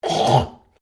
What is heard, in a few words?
MALE HACK; Male Vocal sounds; Sick; Human